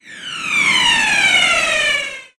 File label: RPG, weapon explosion